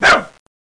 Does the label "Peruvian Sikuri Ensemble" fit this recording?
No